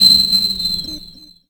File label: Ping - 5A; Jungle; FX; Blu Mar Ten - Jungle Jungle - 1989 to 1999 Samplepack